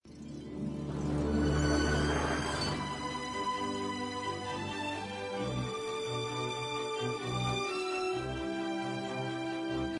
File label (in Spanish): musica romantica teleserie